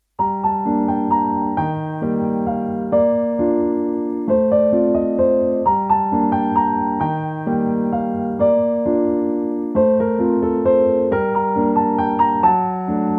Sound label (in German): Tango Walzer